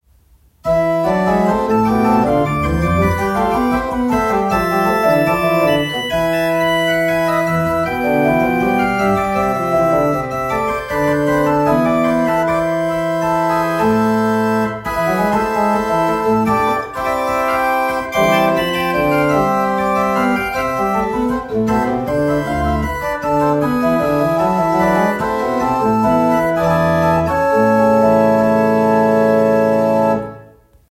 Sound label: Mayer, pipe organ